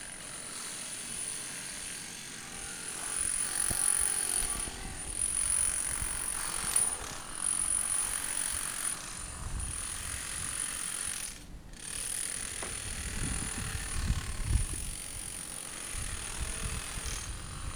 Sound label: tech noise; soundscape